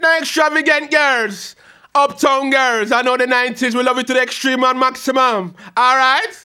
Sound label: HAL RAGGAM, RAGGA-MUFF A maj